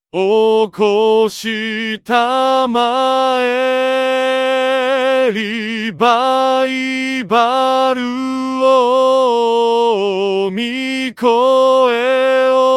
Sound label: tenor; easter